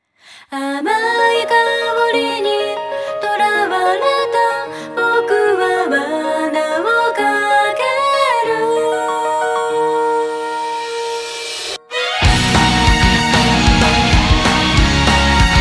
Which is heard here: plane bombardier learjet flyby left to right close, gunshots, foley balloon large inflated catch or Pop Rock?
Pop Rock